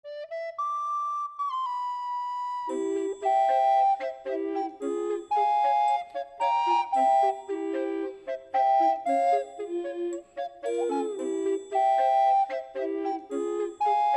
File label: BGM Recorder; PAPER MARIO Color Splash; wiiu